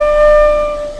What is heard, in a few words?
legato